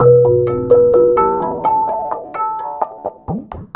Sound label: TABLAS; Zero-G; Chemical Beats